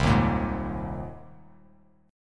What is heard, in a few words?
C Minor 7th Sharp 5th C Bass Standard; Cm7#5 strummed; JGuitar